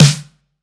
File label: Snare Summer, 909 Snares; Slate; Vintage Drum Machines Volume 3 909 Edition